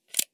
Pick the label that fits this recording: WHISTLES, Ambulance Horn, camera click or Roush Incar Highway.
camera click